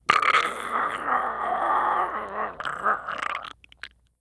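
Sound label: ambient zombie; cstrike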